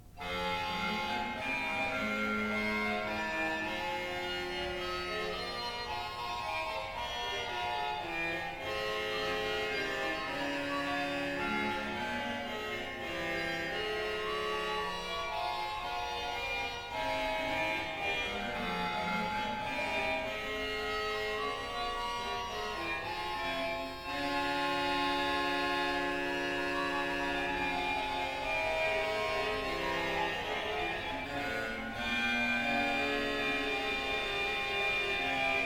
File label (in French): Orgue Concert Prima Canzone; FRESCOBALDI